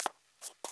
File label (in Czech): preslap; Mafie 1